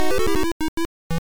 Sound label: Miss; Atlantis no Nazo; nes